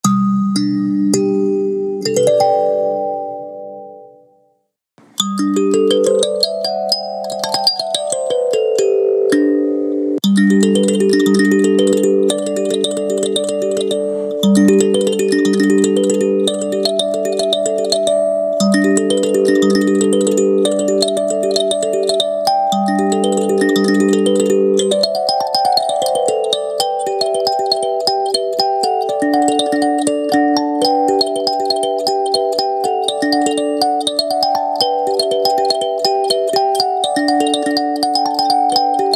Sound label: kalimba b11; hokema; melody